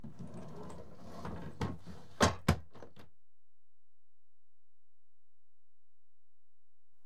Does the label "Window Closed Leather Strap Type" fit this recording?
Yes